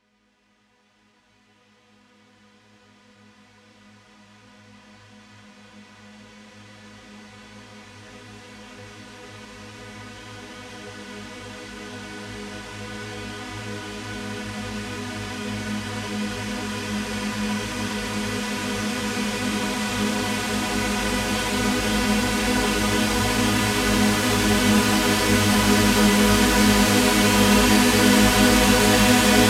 128BPM Super Saw Reversal G#, Melody Fills; 16Bars; Vengeance Samples

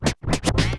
Cuts, Vinyl Record Samples

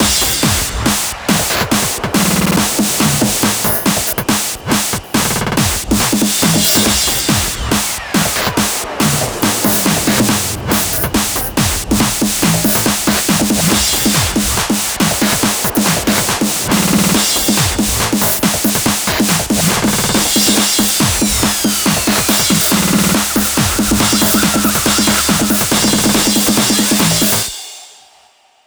140BPM 16Bars, HandsUp & Trance Fills; JollyJumper; Vengeance Samples

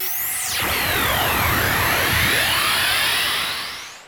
Uplifter

